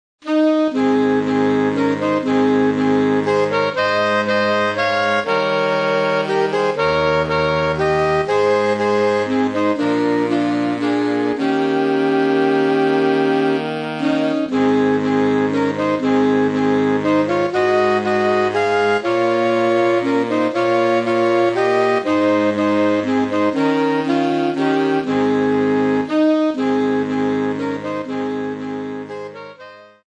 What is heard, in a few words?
away in a manger sax